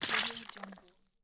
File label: organic horror interface large fleshy button